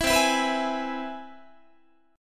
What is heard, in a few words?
C Augmented Major 7th, Guitar; strummed; JGuitar